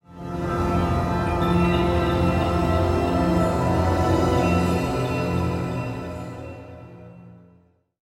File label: Ethereal Play Stinger